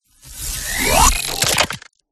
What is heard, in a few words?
CYBER TECH; PMSFX